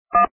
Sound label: DTMF 3